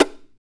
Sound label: CHARANGO FX; Spectrasonics; Hans Zimmer Guitars VOL-2